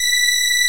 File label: MIXED CHORUS